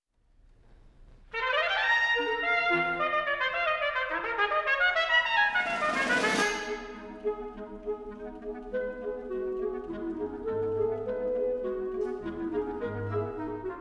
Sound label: Piccolo Trumpet And Wind Ensemble; Concerto For Piccolo Trumpet And Wind Ensemble